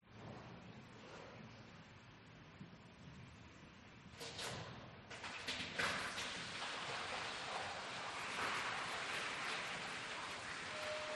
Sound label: OLD STUFF Garage Atmos Pipes Dripping